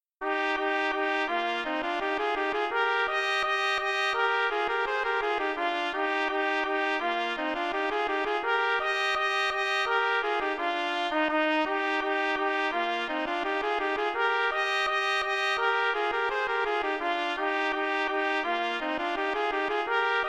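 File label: rondeau trumpet duet; chedeville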